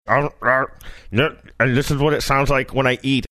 mimicking sound eating tic tacs saying this is what it sounds like